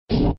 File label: GAMBLE END; Ancient Forest